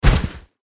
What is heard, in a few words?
mage male attack contact bottom